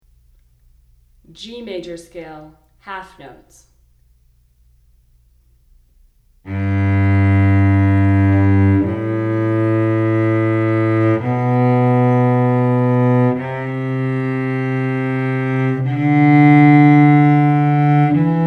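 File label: Scale G Major 1 Octave, cello; 1-Octave Scales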